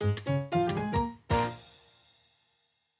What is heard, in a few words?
Survival Bowling - Round Result Lose (Jingle); Nintendo Switch Sports